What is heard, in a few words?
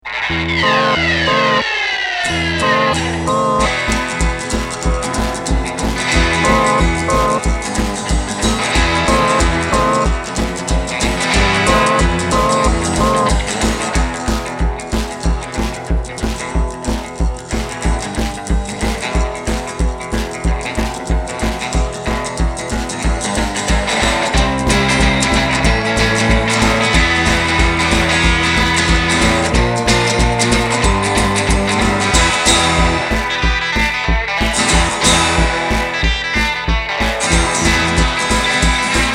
beat